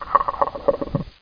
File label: wizard idle; butthead